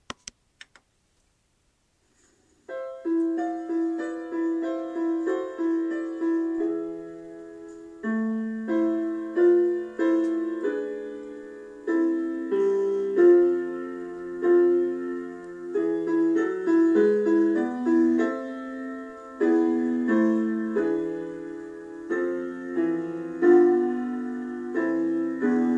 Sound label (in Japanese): 小さな約束②（伴奏のみ）; 成隣小学校 WEB音楽室